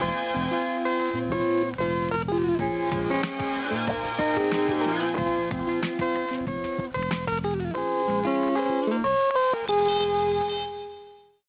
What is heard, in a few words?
Shutdown Melody; Siemens CX65V SFR